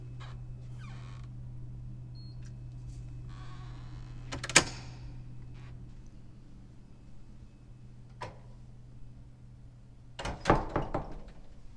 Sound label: Door Opening